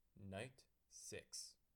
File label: night; mafia